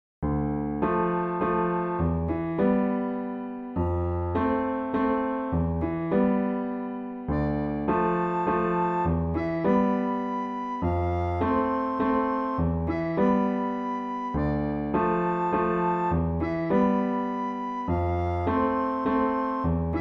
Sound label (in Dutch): sopraan; Blokfluitles in Utrecht